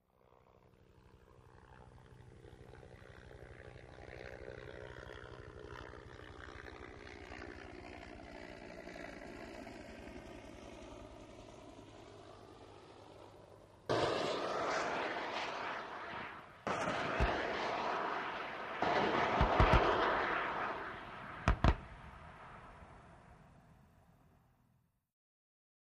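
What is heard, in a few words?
Ah1 Cobra Heli By Medium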